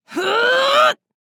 Woman grunting heavy effort

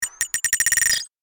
Rocket launcher target acquisition beeps; artillery; gfxsounds

